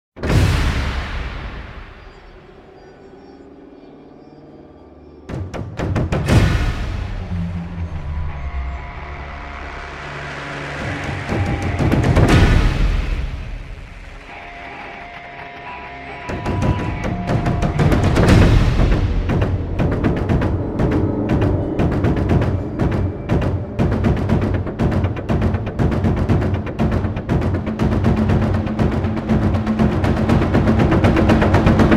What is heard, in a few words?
drums